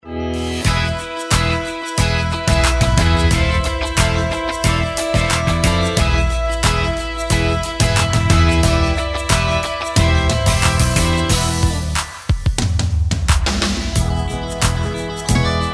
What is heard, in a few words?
DUB FLAMENCO STYLE XL